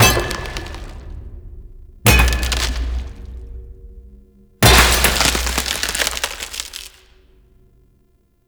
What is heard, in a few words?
Crack Glass; Warframe; Quests; Entrati Labs; Cavia Rank Up Scenes